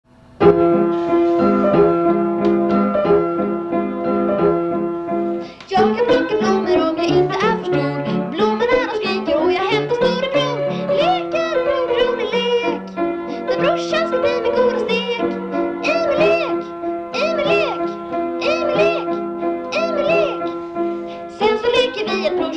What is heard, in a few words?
piano; min lek